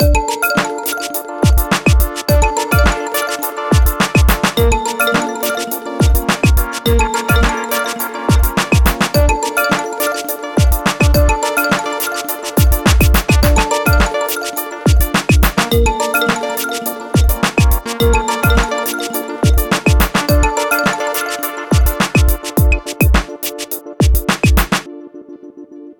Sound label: Chime, ringtones; Coolpad; Note 3